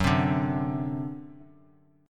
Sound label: C Suspended 2nd Flat 5th Gb